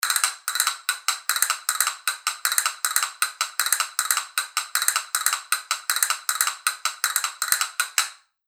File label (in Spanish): madera gris marron, castañuelas